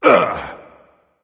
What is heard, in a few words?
grunt hit; smite; voicepack; tyr